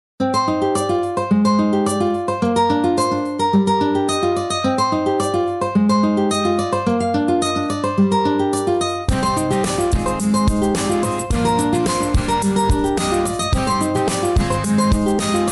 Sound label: Xiaomi Poco M6 Pro Original Ringtone